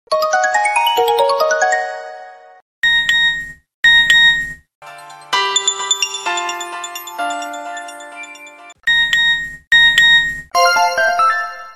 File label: Simply Cool S M S